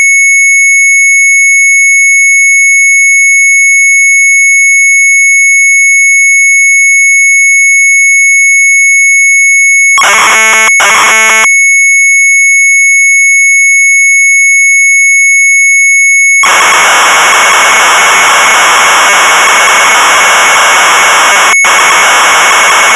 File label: MZ800 Tape; AUTO RUN; sharp; retromelsarchive